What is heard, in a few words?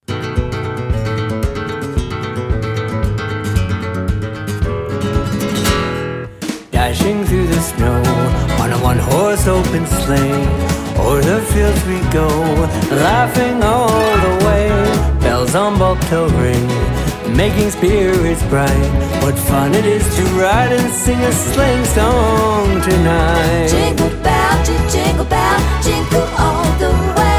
Jingle Bells